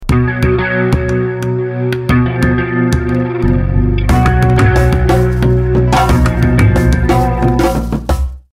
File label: BGM Ringtone